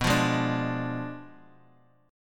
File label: B Diminished 7th B Guitar Open G